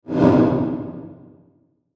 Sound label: Whoosh Distorted Rip; Blastwave; Whoosh Sound Effects